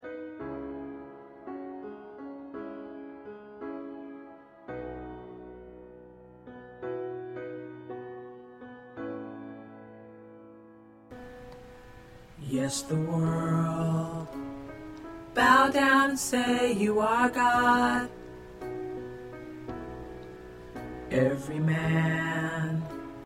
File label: Tenor